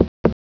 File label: walk